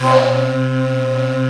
JV JAZZY FLU, JV-1080; Syntec; Wall of Sounds

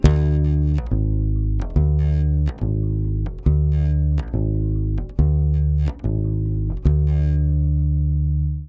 140 DDDIM, Brazil Bossa; USB Soundscan; AKAI

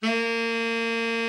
TENOR, TENOR SAX; Best Service; Killer Horns

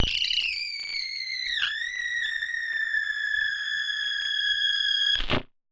Casio, Glitched, malfunctioning synth

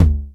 Dry Tom; EMU Xtreme-Lead-1

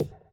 BD; Best Service; Dance Mega Drums

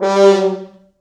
4 FrHorns FXMOD; East West - Quantum Leap Horns Sax; 90's Sample CDs

